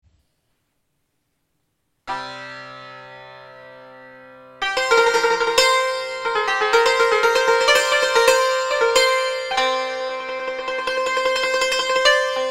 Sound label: santoor